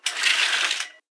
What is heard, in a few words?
curtain move; Ed Edd n Eddy; Adromulus Pack